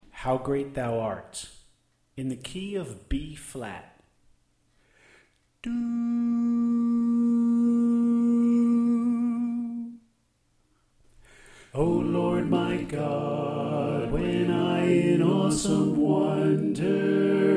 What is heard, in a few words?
Soprano Left